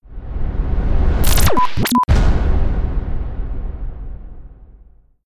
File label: impact